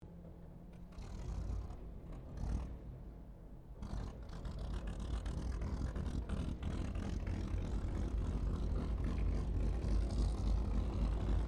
AIRCRAFT HAWKER SEAFURY STARTUP DISTANT, Hawker Seafury fighter-bomber; Airplanes; Vehicles